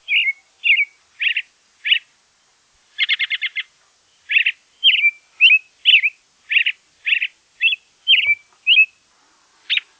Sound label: Birdy